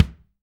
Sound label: BD, DR BD; Best Service Gigapack 2